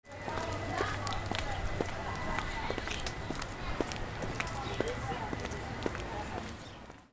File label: FOOTFALL